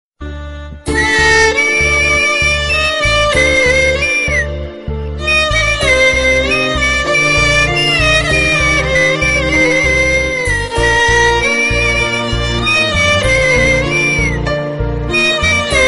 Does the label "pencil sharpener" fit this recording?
No